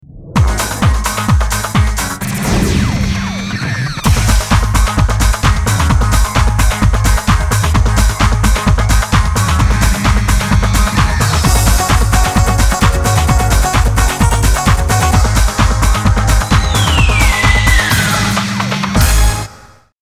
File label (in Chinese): 电视包装音乐